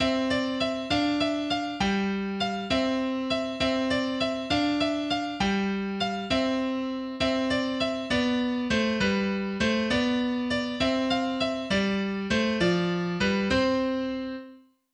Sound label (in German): Französischer Tanz, mehrstimmige Gitarrenstücke; Campion